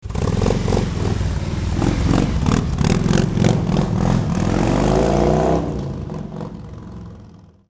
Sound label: Harley Davidson